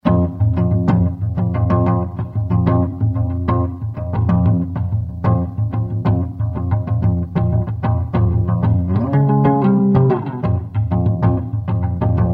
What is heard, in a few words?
Bass Solo